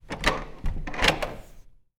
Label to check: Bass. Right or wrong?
Wrong